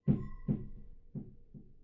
Wardrobe Knock; MiSide

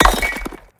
Break Shot; MiSide

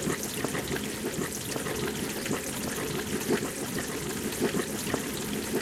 Hand Blood; Game rip; MiSide

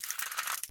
Cartridge Dismantling Bolt; MiSide

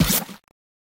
Hand Kick; MiSide

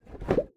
Check Furniture; Game rip; MiSide

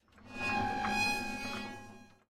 Door Iron Open; Game rip; MiSide

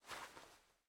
Item Hand Move; MiSide

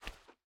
Barefoot; MiSide

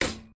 Cart Stop; MiSide

